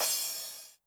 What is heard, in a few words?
crash cymbal Med, 909; classic machines; 909mod; CC - CRASH CYMBAL